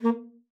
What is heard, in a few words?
A#3, ALTOSAX; Xsample VOL-2